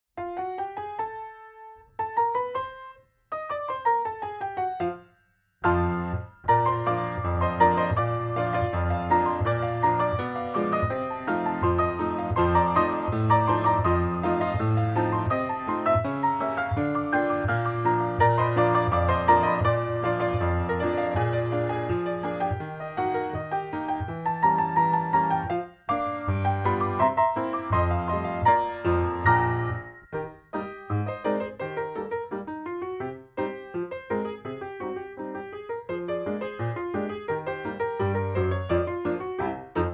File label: Piano